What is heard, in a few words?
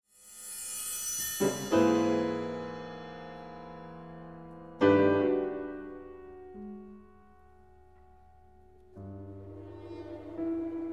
Piano Concerto 2nd half excerpt; Concerto for Piano and Orchestra 1993